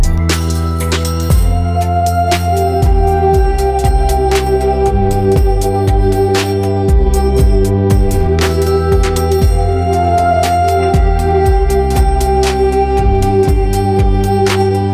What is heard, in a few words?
ambient soundscape atmospheric pads dreamy; ai